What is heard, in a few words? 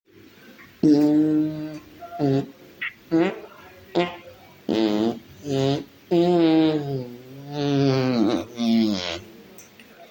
fake fart